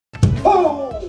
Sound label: hit his head haha; gandolf